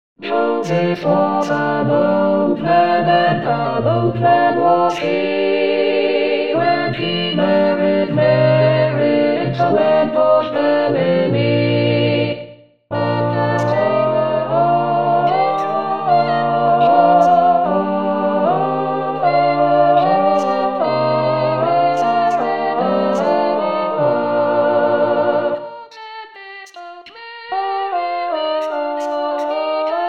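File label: cherry tree Alto 2; Cherry Tree Carol; xmas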